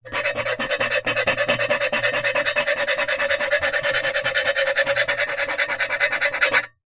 foley metal knife sharp tip scrapes scratch fast metal surface